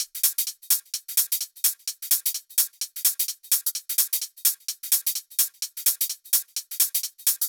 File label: 128BPM Flatbeat Kit